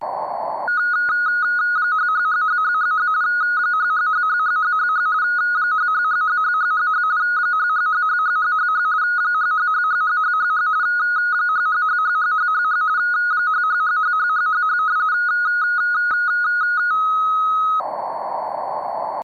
Rtty 45 baud

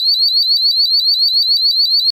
In Store Anti Theft Alarm

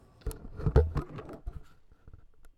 Sound Recorder Grabbed

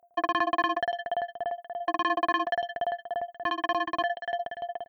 Sci Fi Phone Ringing

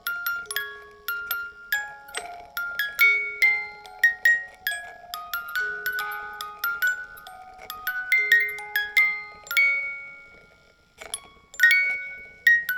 Music Box Loop

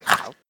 Nom

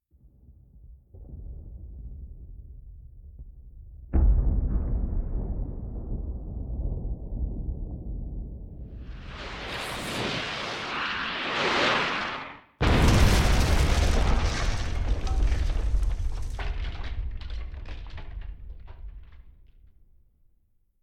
Incoming Canonball Castel; Kronborg

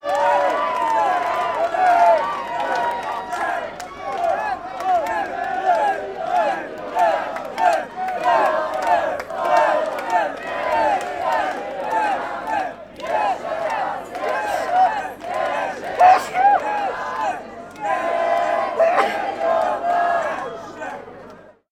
Happy Birthday Crowd

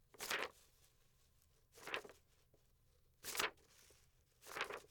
Paper Flips

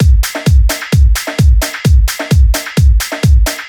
drum loop

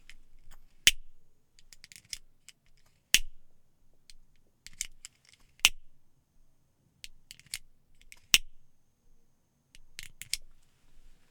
Lighter

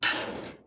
Metal Click

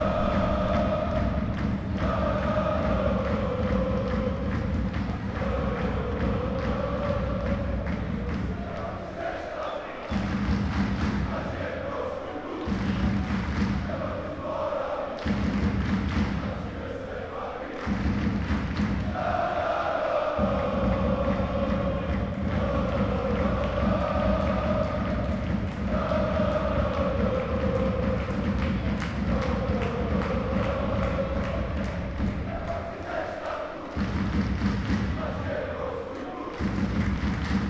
Hockey Cheering Section; Kometa Brno

